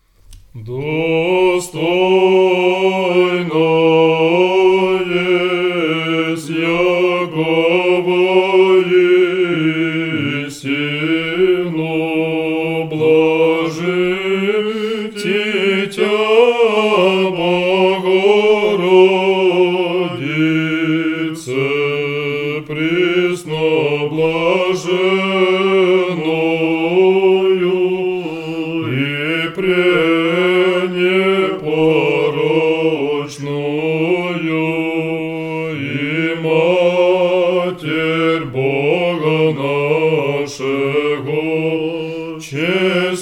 it is truly meet tone 3

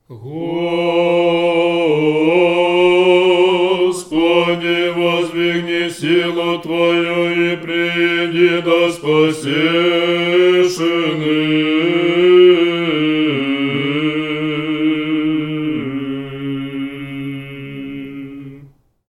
prokimen sunday tone 6

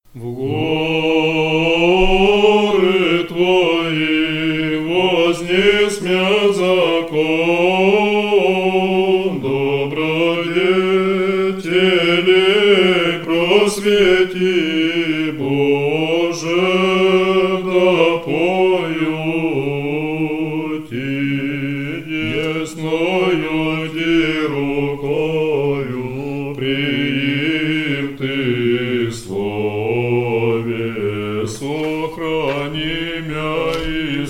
second antiphon tone 8

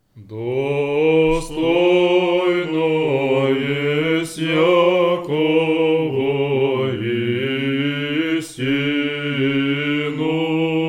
it is truly meet tone 3

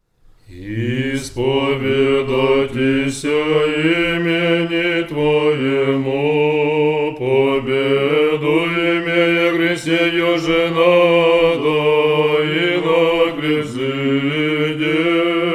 tone 3; tone 6; sunday

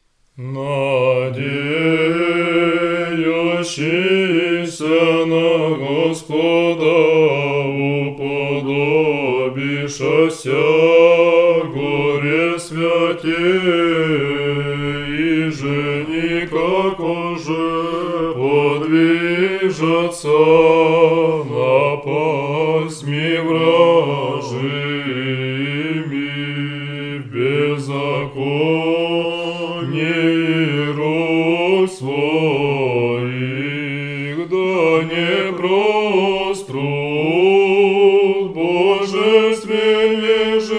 third antiphon tone 3

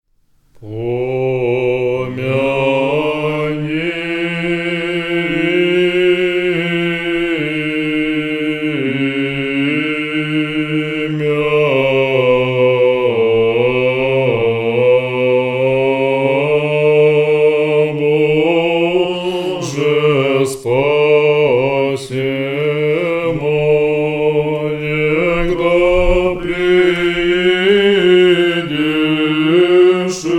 beautitudes tone 6 znamenie

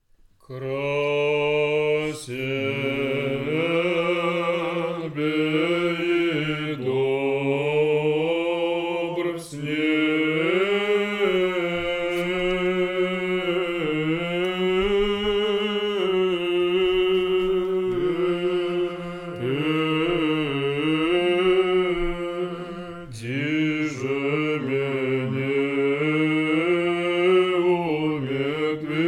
beautitudes znamenie tone 7

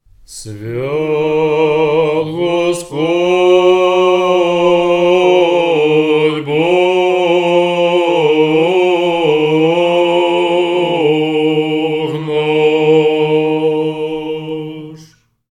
holy is the lord tone 6

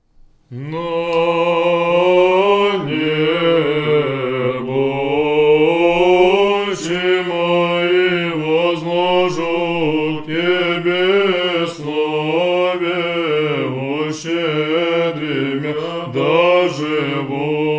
first antiphon tone 6

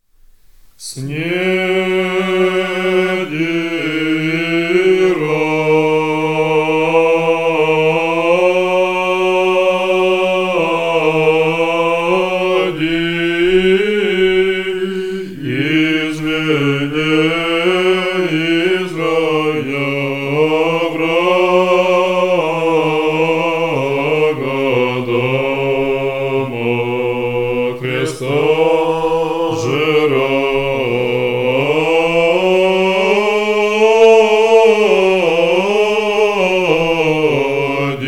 beatitudes znamenie tone 1

